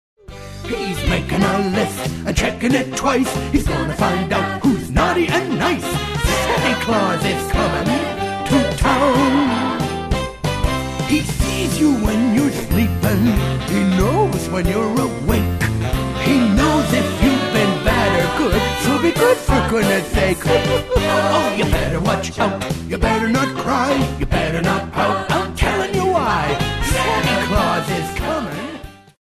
karaoke vocal; christmas favorites; disney

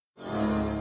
match 4; john carter; lost symbol

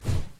carousel slide; fairies

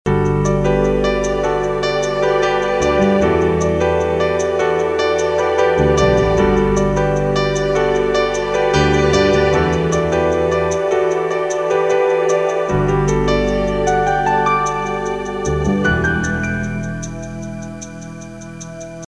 EasyGoing music; kritter krawler

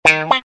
guitar mute

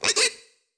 Squeal; Monsters University; Archie

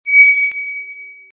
crystal smooth

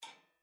bird; starwars; episode vii the force awakens

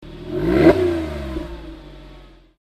rev; disney; cars; Sally

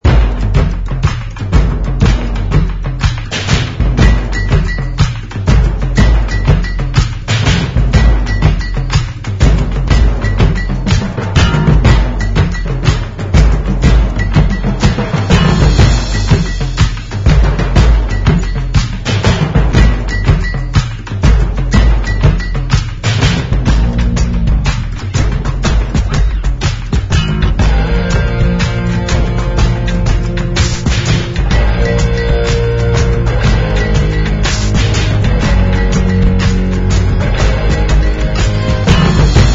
music; epic ninja bball moves

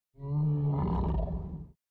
creature; starwars; episode vii the force awakens

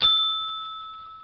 deskbell; pooh

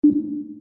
nav

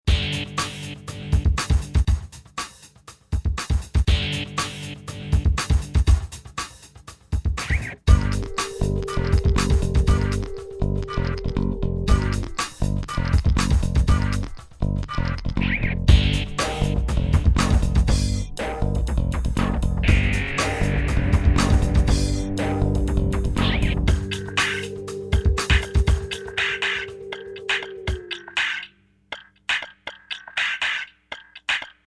skateboard

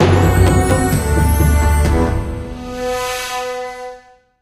win Level; john carter; lost symbol

